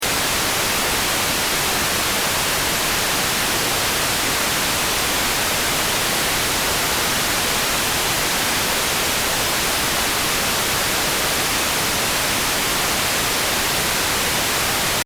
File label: Pure White Noise, Constant, even whitenoise; Loopable/looping; Noise & Static